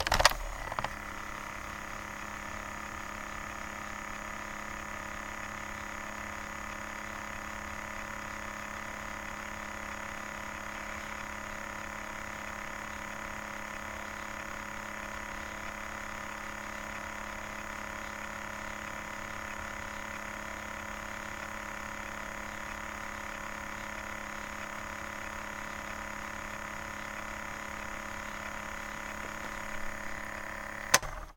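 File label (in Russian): мотор walkman включение и выключение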